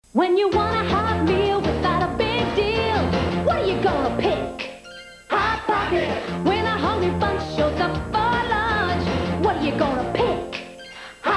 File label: JINGLE; Hot Pockets; Speaking Human podcast; brand jingles